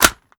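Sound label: weapon foley assault rifle reload mag in; gmod; viper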